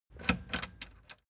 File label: plastic button latch click enable slow